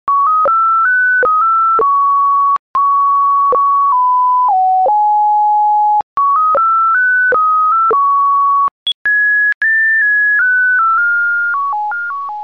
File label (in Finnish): monofonisia